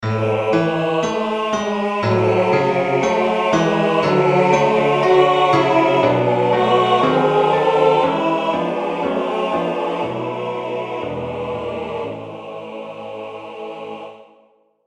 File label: amen, Male Voice Choir; Arrangements for Male Voice Choirs